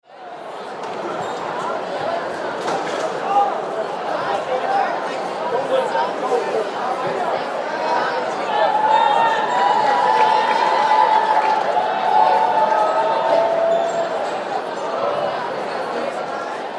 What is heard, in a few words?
Crowd Arena Chatter; Crowds (Sound Effects:Backgrounds); SPORTS; SoundEffects-Blastwave